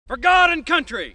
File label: battle cry